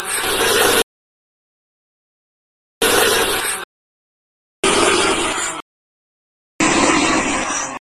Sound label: Laser burn